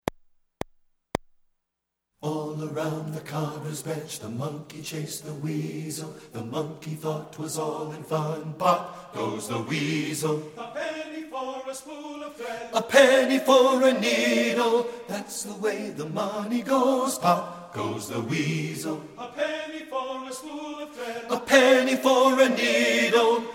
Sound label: bass; POP Goes the Weasel